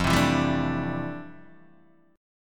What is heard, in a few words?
F Major 13th Guitar Open D